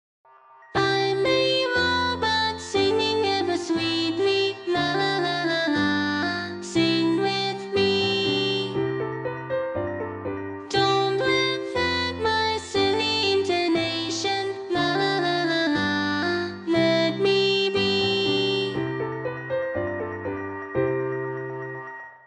synth robot

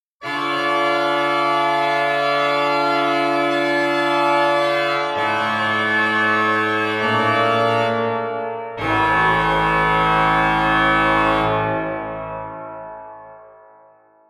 Classic Reed

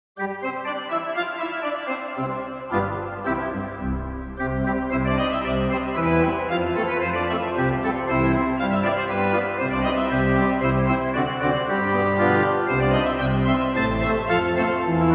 Organteq; Eugene

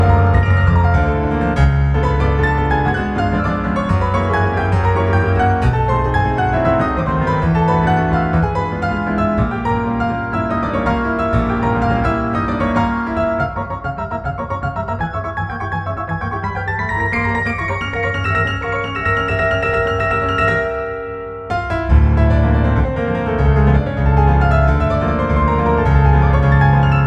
Waltz; Mephisto

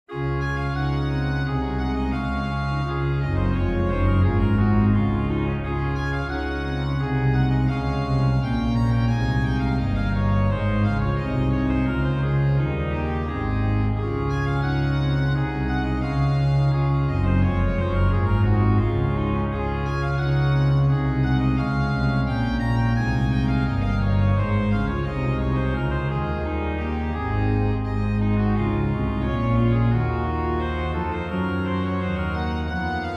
Gavotte I Suite 3 D Major BWV 1068; Bach J.S.; Orchestral Suite No 3; Pianoteq

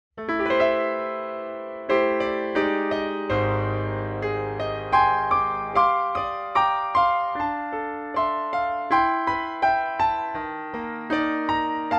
clink; Pianoteq

